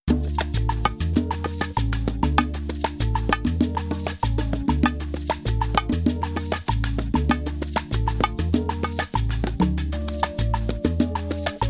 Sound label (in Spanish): mambo influenciado sin piano sin guia